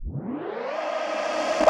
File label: Reverse Perc; hard techno; hard techno vol1